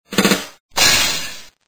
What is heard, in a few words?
drumrol